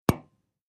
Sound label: Camera Strobe Light